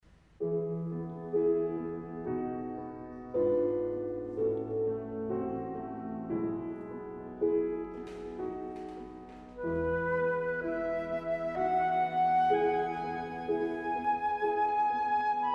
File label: Schubert Arpeggione Adagio